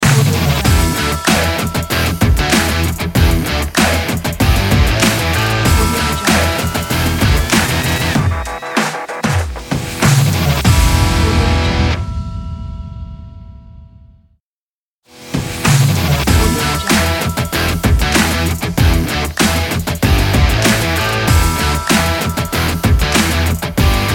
energetic upbeat action sports rock commercial logo